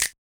Yamaha DJX 2B, Snaps

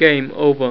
Game Over; ST-XX Amiga Soundtracker Sample Packs

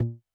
Tomtom Mid, Technics U90

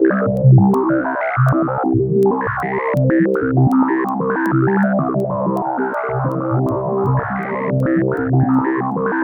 C3, Synth Line; 90 s Sample CDs; NiceBeats; SynthLine VOL-20

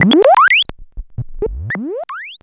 ANALOG BLEEP, SCI FI ALARMS; Zero-G; Ambient 1

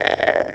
"eh", Talk Box throat

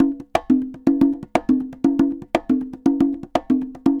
CONGAS, DRUMS SAMBA; Best Service ProSamples vol.14; AKAI

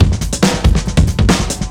Beat Style, 140bpm, breaks

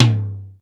DRY T, drum; Crypton; DrumBase5000

